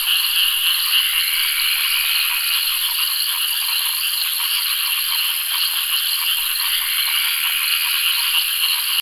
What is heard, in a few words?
Ambience Exterior Lake